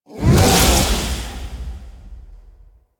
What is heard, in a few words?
Condemnd Impact; Warframe; Warframes; Priest